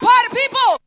VOX; ZERO-G; Datafile

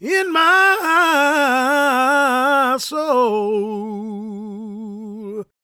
GOSP MALE, Vocal Planet Gospel

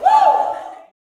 "WOO", Gospel, YELL SHOUT; Spectrasonics; Vocal Planet Vol.1 Gospel; akai

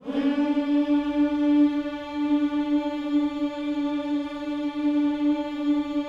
"hmms" D, gospel; Spectrasonics; Vocal Planet Vol.1 Gospel; akai

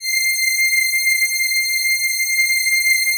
Atmo Pads; Best Service; XX Large

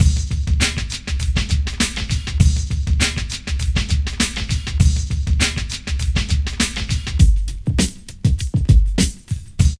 PHAT LOOP; E-Lab; X Static Goldmine 2

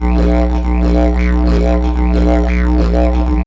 DIDGER

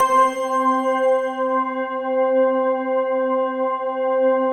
PAD, Pads; Best Service; XX Large

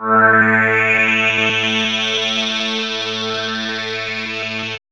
SWIRL; E-Lab; X Static Goldmine 1

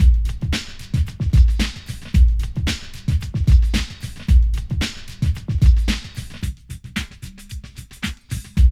PHAT LOOP; E-Lab; X Static Goldmine 2; akai

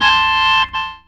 ORG RIF; E-Lab; X Static Goldmine 2; akai

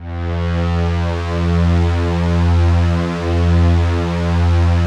ATMO PADS; Best Service; XX Large

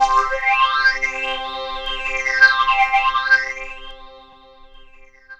SYN CHD; MIX SYNTH; E-Lab; X Static Goldmine 2; akai

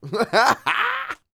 LAUGH, Vocal Jazz Fx

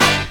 HORNHIT, HORN STAB; E-Lab; X Static Goldmine 2; akai

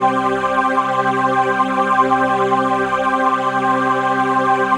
PHASE PAD, ATMO PADS; Best Service; XX Large

